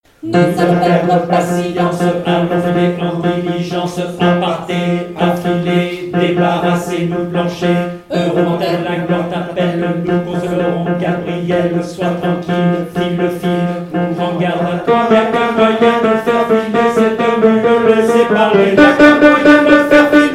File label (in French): Tenors; Le Sire de Vergy; Nous allons perdre patience